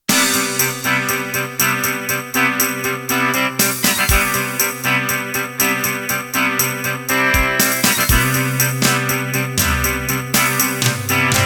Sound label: Heavy Rock Intro; Intro #4